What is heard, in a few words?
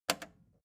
record player repeat jump out click fast